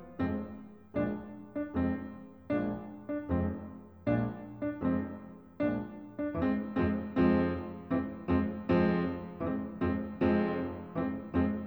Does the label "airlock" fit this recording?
No